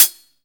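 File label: EFEX hi-hat, FX DRUM; AKAI S6000 CD-ROM - Volume 1; DRUM RYTHM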